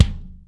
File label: basedrum